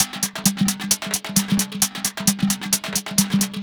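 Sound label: Percussion, 132bpm, Nu Dance Electro; Zero-G; Soundclash; Combi Loops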